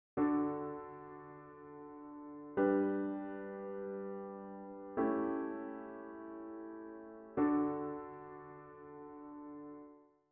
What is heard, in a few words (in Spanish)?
cadencia